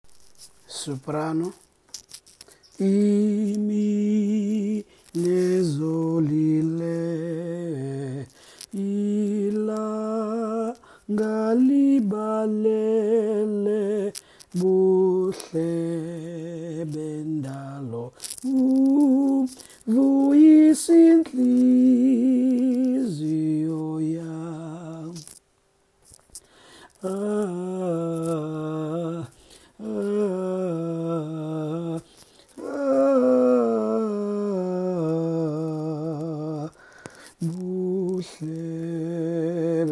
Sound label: soprano